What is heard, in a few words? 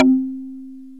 BOTL MARIMBA; OMI - Universe of Sounds